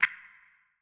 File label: switch Clock minus